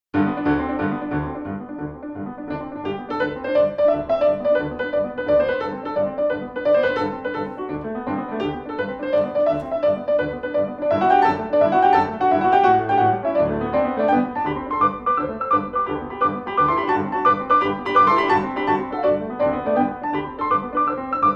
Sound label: bounce 2 piano tarandela